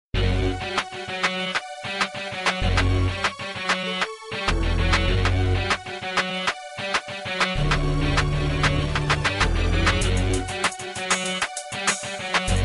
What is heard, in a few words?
hip hop beat